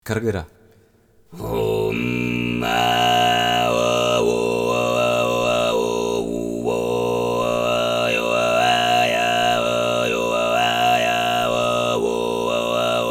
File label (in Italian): audioesempi kargyraa